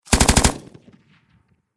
Warfare Machine Gun Pkm Russian Short Burst Close Perspective; Machine Gun Sound Effects; SFX Bible 6